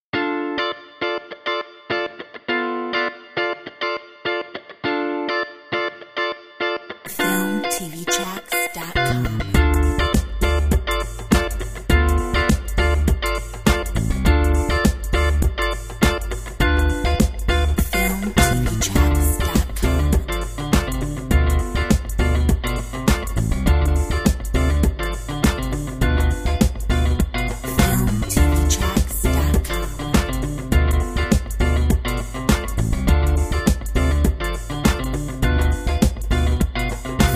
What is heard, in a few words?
Soul funk